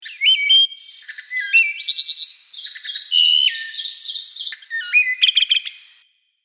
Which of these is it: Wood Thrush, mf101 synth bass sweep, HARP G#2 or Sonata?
Wood Thrush